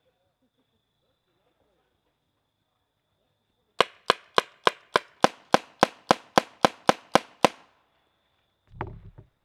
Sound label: spyder, guns; spyder se less clip